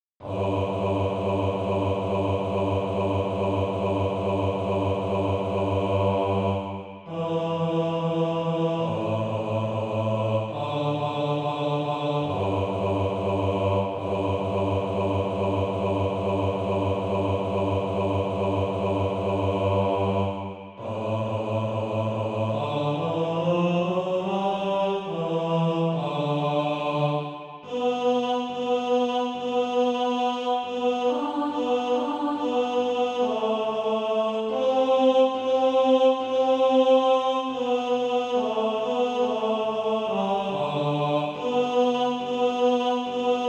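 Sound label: bass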